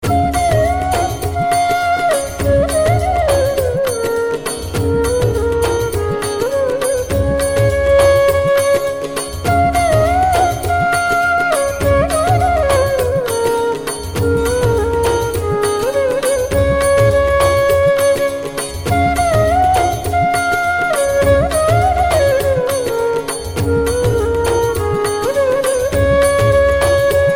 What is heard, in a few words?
flute music ringtone